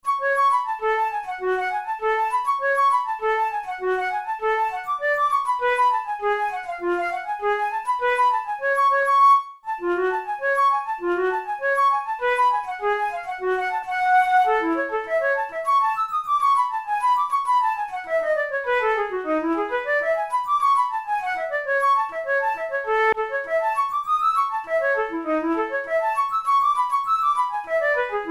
methode pour la flute study; drouet

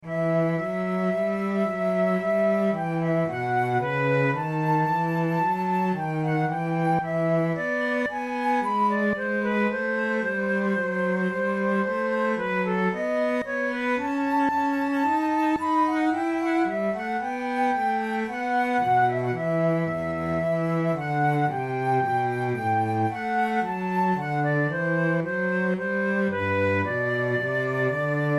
adagio, flute

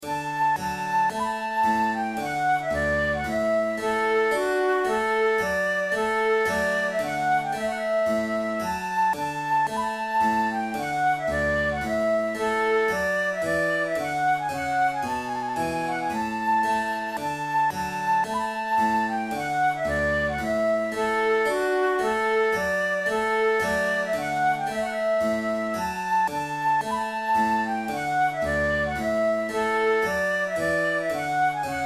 trumpet tune, flute; clarke

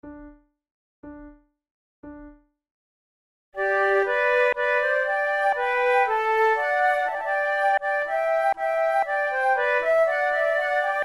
trio, flute; charpentier; te deum

